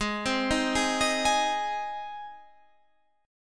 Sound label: Ab Major Ab down Guitar Standard; arpeggiated; JGuitar